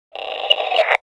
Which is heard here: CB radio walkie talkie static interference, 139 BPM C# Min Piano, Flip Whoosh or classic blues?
CB radio walkie talkie static interference